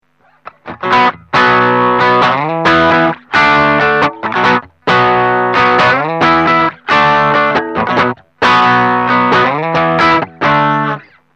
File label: neck, RangeMaster